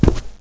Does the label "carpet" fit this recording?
Yes